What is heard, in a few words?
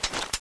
clipout, weapons; mgsm